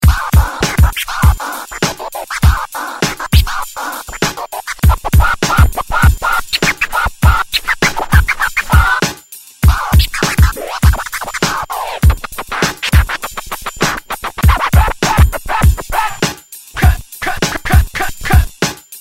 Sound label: Scratch